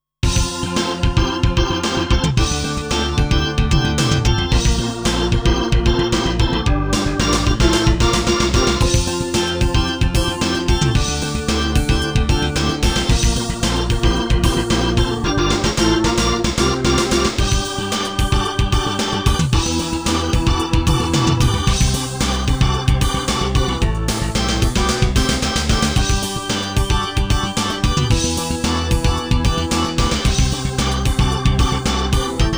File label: B3 Organ; Masterbits CLIMAX 8